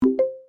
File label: notification sapphire, iphone